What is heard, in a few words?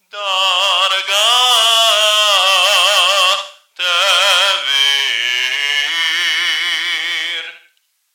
haftarah trope line tevir